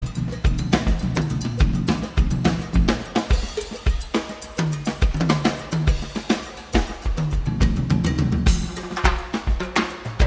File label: Drum Solo